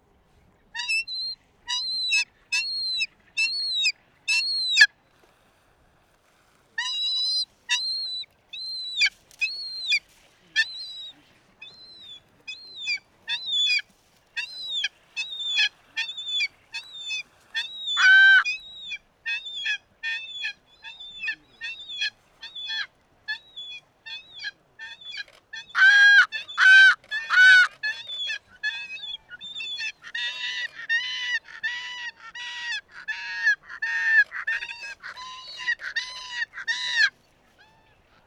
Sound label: Phalcoboenus australis; Falklandcaracara; roofvogels; Falconidae; Falconae